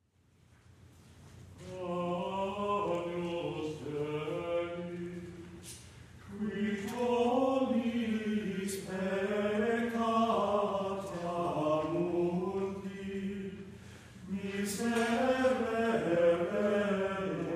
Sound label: Agnus Dei chant